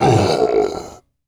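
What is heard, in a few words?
dragon hurt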